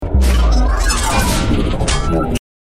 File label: fast transform